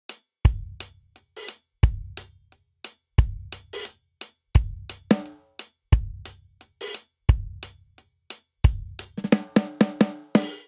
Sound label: Swing Reggae Breakdown 88 bpm; 4 4; MIDI Clips; Ableton; Factory Packs; Drum Booth